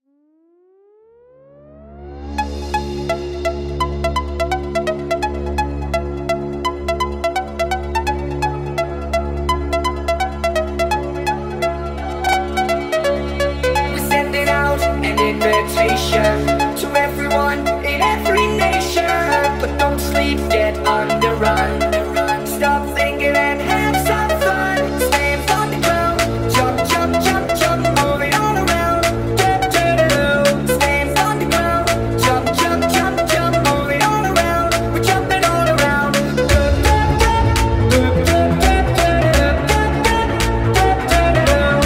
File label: hardstyle bootleg